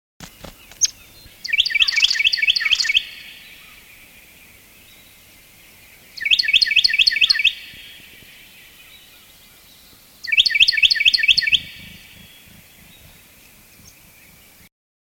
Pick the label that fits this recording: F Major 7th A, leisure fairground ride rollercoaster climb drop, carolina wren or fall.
carolina wren